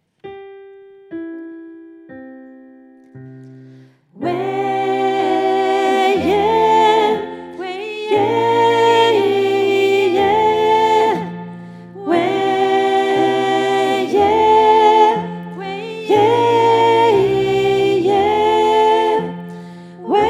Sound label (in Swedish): Rid over skog stämma 3